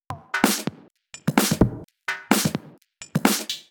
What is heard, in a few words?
Minimal Loops